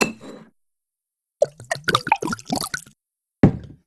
wine pour out; barok

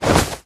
open bag; ahlbi

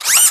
goggles up

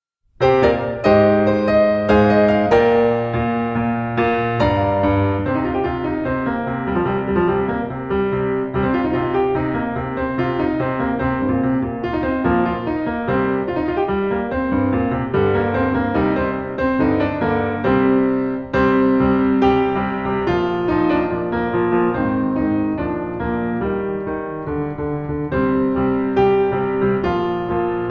piano

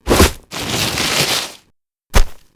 dispense; scuttlebutt

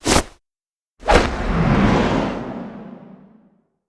throw glove; nahyuta

